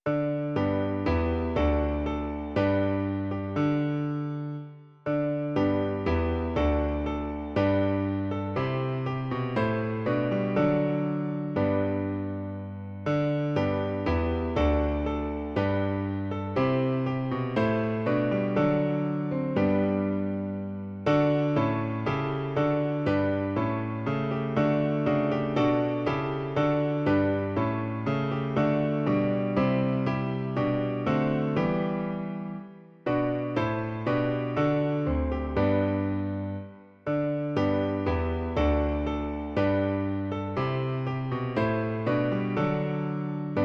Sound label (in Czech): klavír, Klavírní verze